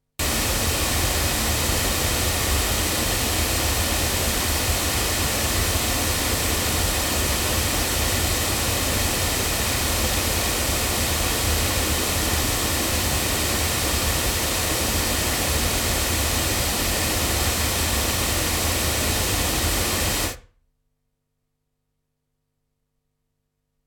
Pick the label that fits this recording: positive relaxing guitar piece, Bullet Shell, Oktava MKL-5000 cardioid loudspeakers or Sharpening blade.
Oktava MKL-5000 cardioid loudspeakers